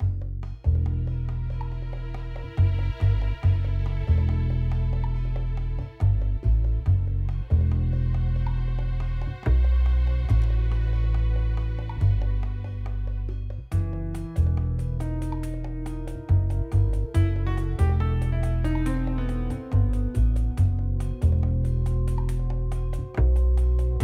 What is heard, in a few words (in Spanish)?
music pantano; Lootlite; Xbox Series X/S